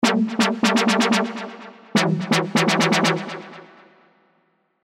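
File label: synth, old skool synth; Paper Cut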